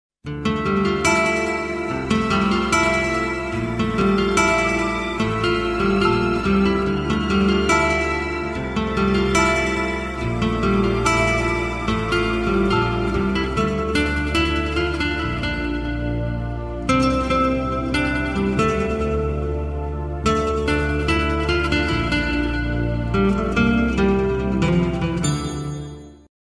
guitar background song ringtone

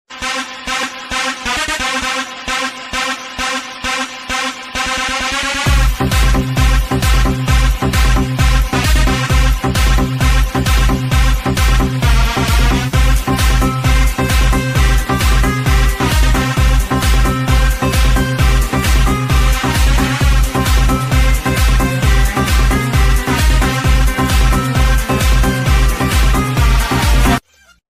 remix ringtone

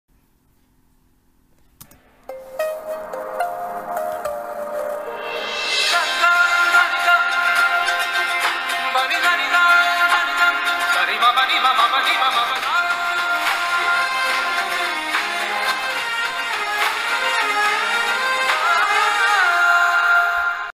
loud bgm ringtone; allu sirish; anu emmanuel

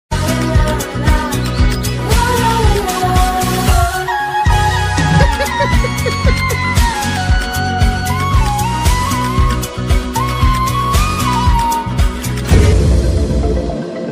trailer bgm

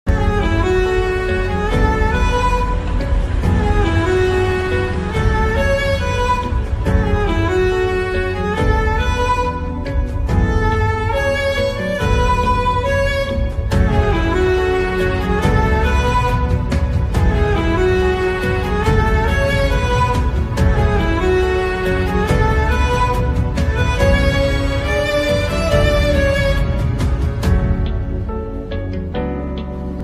smooth music relax

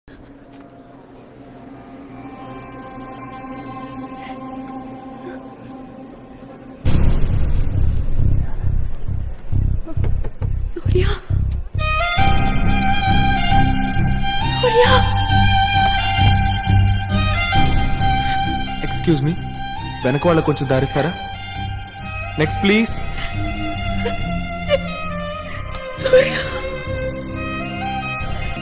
emotional telugu bgm; raja rani

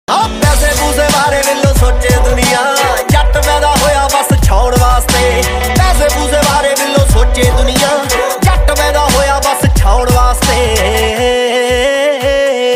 instrumental ringtone punjabi song

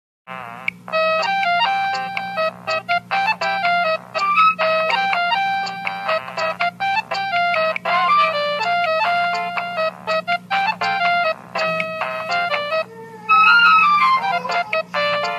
arabic nokia ringtone sound effect

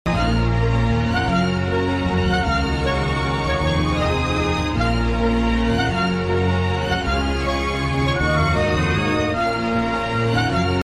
heart touching bgm ringtone

